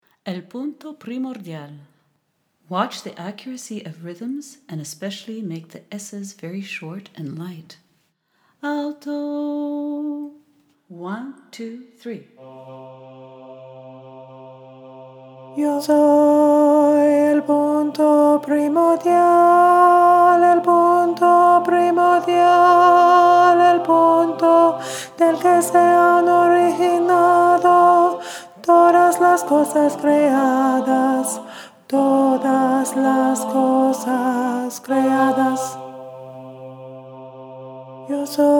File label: alto, choral music